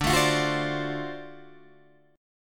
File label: D 7th Sharp 9th D down Guitar Open D